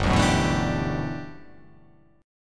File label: G Major 13th; strummed; JGuitar